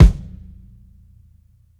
KICK, drums; BASEMENT DRUMS VOL 2

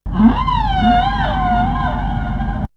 Animal

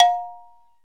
TUNED C, Cowbells; Roland L-CD701; PRC Latin 1

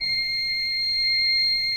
C6, Cathedral Organ, ROHRFLOETE; Propeller Island

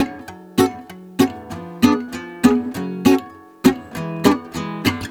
GUITAR, Balkanish Traditions; USB Soundscan vol.63; AKAI

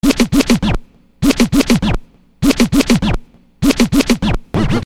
Scratch, Hip-Hop; Construction Kit; Sonic Foundry (Sony Creative Software)

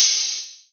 HH, Drum Mashines; EdgeSounds

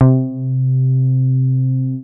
C3, BASS; Q Up Arts; Dream Experience; BASS BANK 16

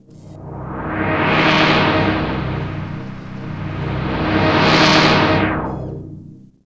HIT, STABS, House

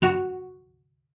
CELLO FINGER F#4; 90 s Sample CDs; Xsample VOL-5

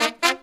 Phantom Horns, 132BPM; 90 s Sample CDs; Zero-G

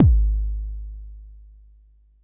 Bassdrum, Jomox Xbase09

